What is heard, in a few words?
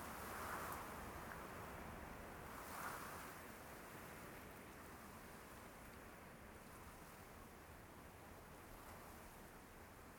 tree wind